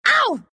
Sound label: pain, ru; Dota 2